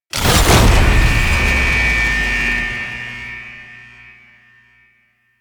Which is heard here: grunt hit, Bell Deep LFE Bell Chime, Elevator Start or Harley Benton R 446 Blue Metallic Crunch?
Elevator Start